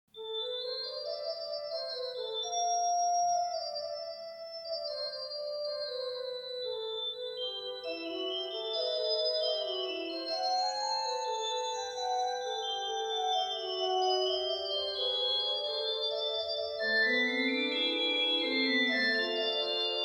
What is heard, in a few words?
Fugue in A Minor; Bach; Little Prelude and Fugue in A Minor BWV 559